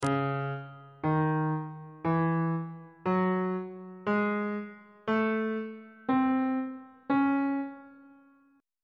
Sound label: c sharp harmonic minor key signature on bass clef